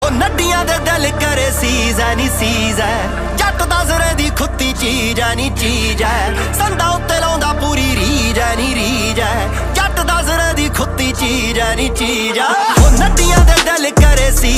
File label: PUNJABI SONG RINGTONE